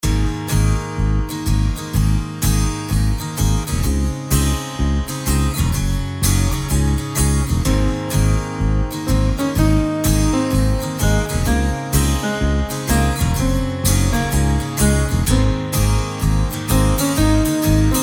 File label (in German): Blasinstrumente